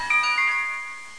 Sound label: Healing; Siege of Darkwood 1.0